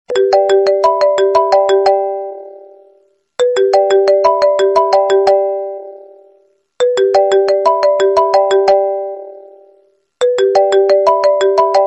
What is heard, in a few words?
iphone message ringtone